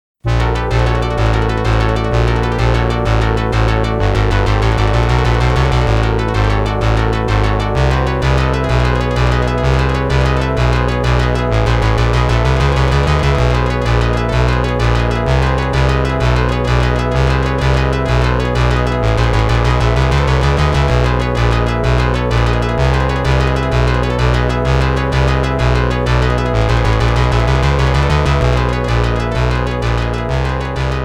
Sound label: Stage 5 Boss BGM; Gun Smoke